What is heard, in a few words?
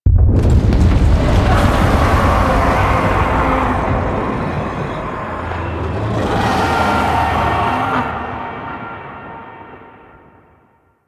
Losing Control Stinger; Duviri Quest; Mission One